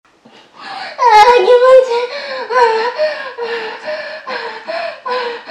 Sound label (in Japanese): あぁぁきもちいぃぃ